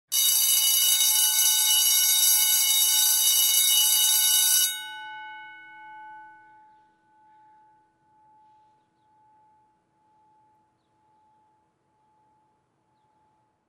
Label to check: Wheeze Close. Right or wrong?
Wrong